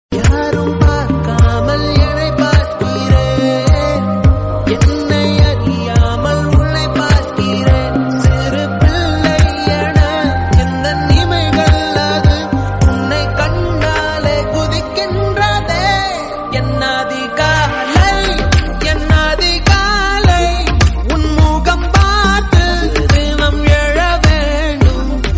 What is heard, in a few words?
tamil movie song ringtones and bgm; paiya; actor karthik